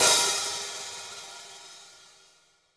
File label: hihat - opened; JazzFunkKit